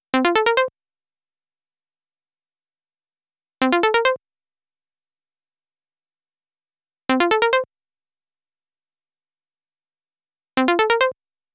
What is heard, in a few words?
Pluck DRY 138BPM, Fmajor; Melody & Synth; Vengeance Samples; Another Day Kit